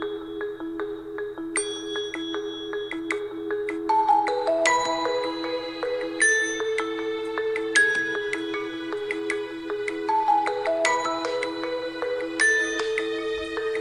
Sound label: huawei wake up sound